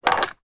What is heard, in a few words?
wooden handled skipping rope pick up from table; sport